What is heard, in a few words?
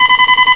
prox warn